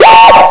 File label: UFO